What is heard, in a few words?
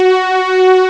string; ST-XX Amiga Soundtracker Sample Packs